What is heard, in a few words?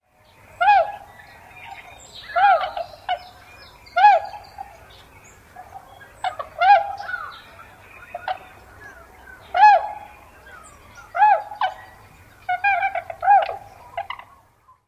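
hoh! calls, Tundra Swan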